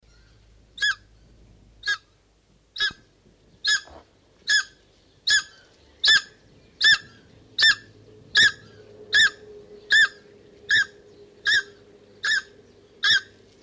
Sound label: takahe song, bird song